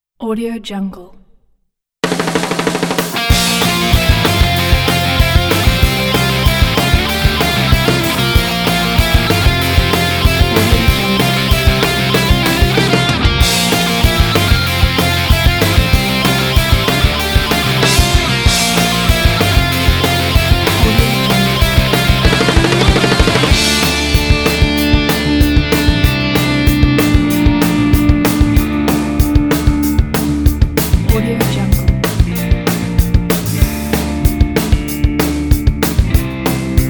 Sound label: Happy Punk